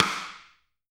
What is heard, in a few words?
STICK, Drum Dry; East Collexion; Drum 1